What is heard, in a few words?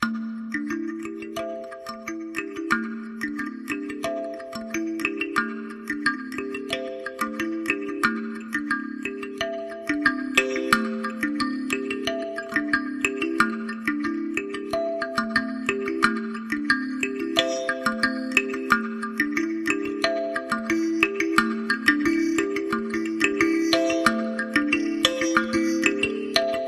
Kalimba Ostinato